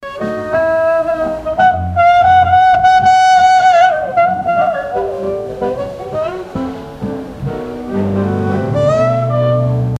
jazz

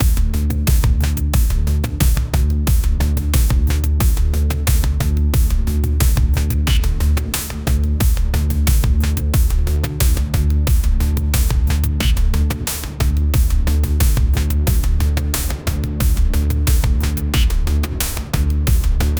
dark futuristic tone, trailer

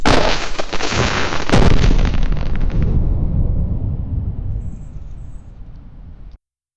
big explosion; rubber duck

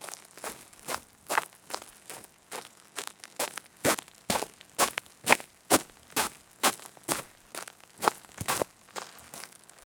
ground

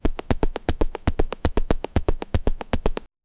character movement

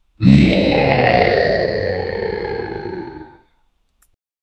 scary giant demon monster

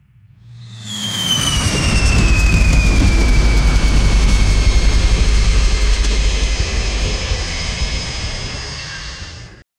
helicopter crash mechani; accidents

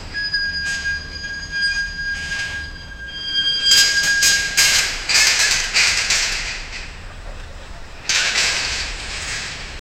construction site sounds

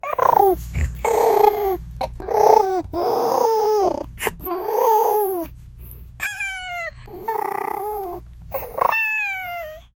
happy laughing, cats

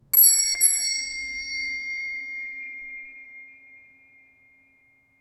single doorbell ding

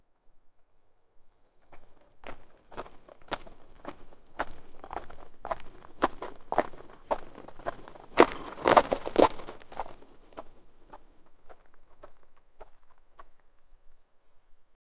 tiger with saddle on back, closeup

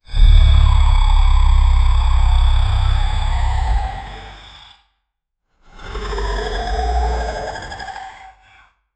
western dragon roaring

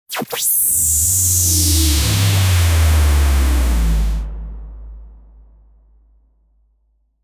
super strong impact swipe, swoosh

